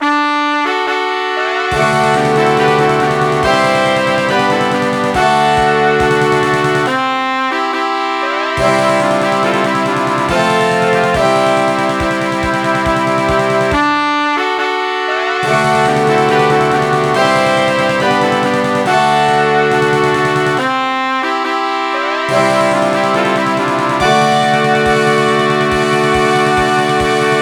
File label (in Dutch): Concoursfanfare